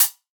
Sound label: Closed Hihat; Cymatics; House Starter Pack; Cymbals